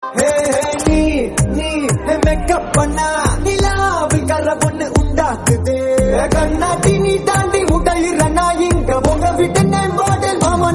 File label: Tamil